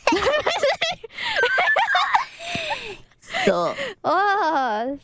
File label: laugh